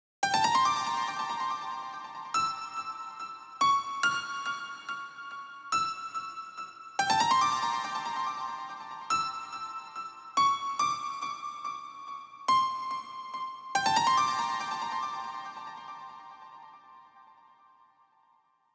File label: PWM Kit 142BPM Chorus - Piano WET, Gmin; Vengeance - Essential Clubsounds Vol.5; Melody & Synth